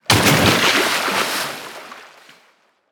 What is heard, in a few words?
Splash, Water